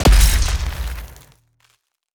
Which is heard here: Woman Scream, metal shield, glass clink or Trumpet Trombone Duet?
metal shield